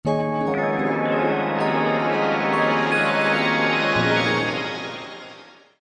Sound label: Roland V-Synth; Arcadia; Factory Patches